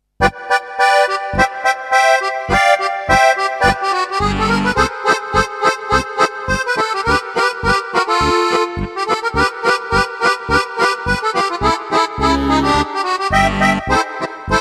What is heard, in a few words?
wedding march